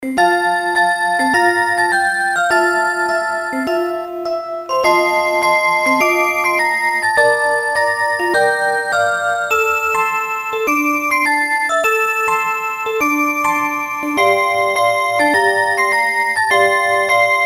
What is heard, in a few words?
rhythm song, clocks